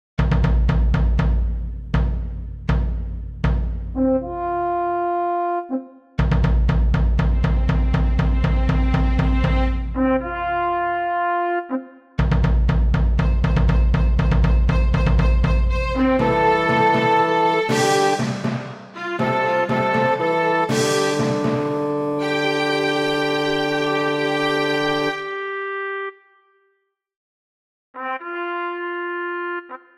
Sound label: Fanfare